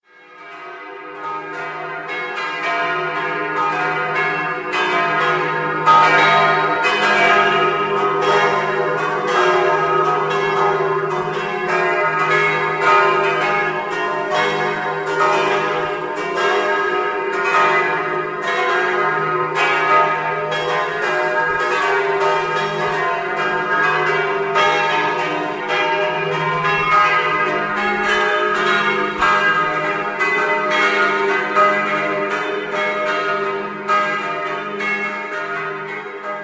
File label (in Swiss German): chilche glocken; uesi